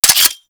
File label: Grenade Launcher Mech; Grineer; Weapons